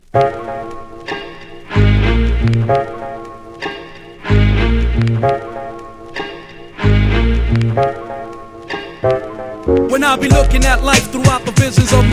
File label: hardcore hiphop